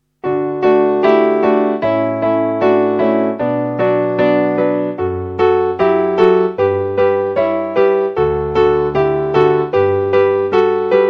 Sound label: canon, piano